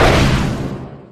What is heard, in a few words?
seek strike; the doors 2 creatures; JJK